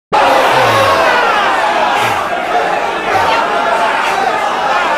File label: crowd